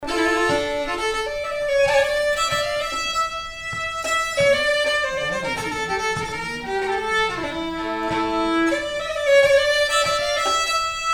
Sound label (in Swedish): Polska